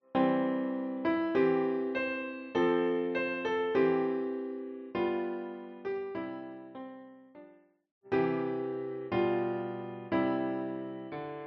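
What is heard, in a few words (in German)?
Kinderlieder, piano; Kinderlieder Vol.II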